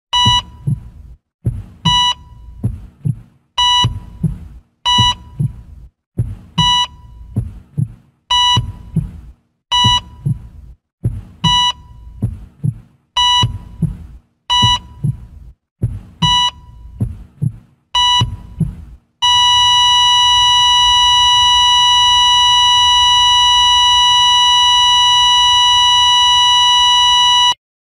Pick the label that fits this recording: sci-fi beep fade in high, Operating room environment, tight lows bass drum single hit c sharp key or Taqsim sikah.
Operating room environment